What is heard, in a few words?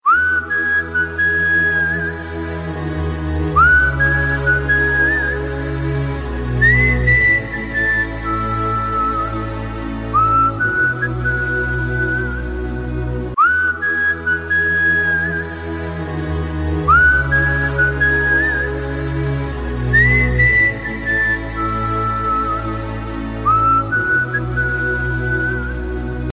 best message tone ringtone